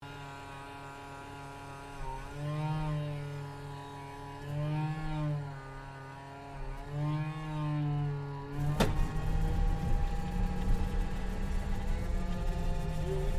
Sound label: AIRCRAFT LOCKHEED HUDSON STARTUP INTERIOR, Hudson twin engine light bomber; Airplanes